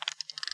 tric open; federation

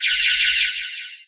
pulse phaser; federation

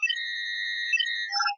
processing, computer; federation

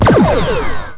disruptor; romulan

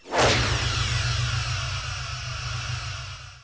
Deflector, technology federation

